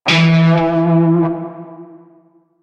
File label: Shawzin Flourish Oct Two E; Music; Warframe; Narmer Shawzin